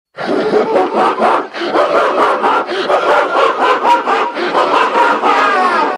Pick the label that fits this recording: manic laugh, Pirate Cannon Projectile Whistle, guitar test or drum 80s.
manic laugh